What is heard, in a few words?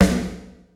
Snare